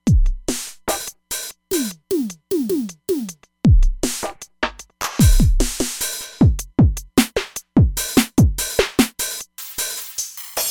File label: Machine Kit, synth